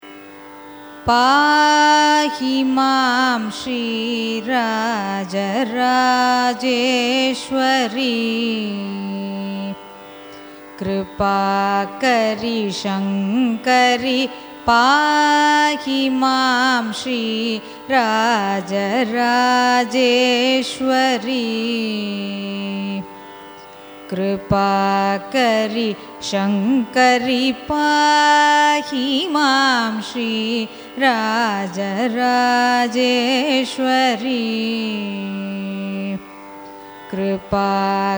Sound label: raga janaranjani carnatic